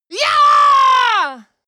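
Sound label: female combat attack long, screams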